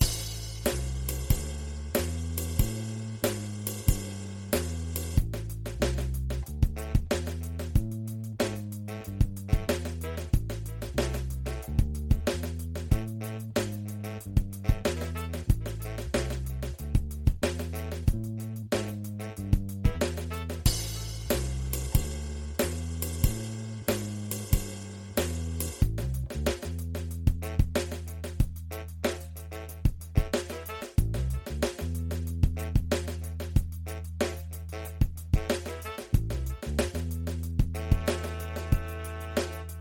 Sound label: We Drum in Peace